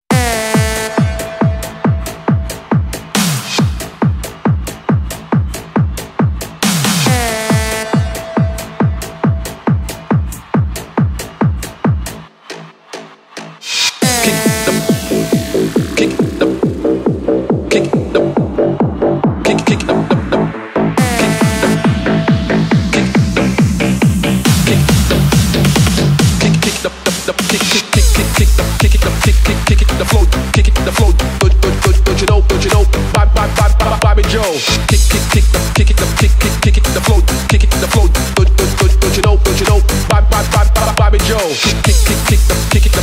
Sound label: best dance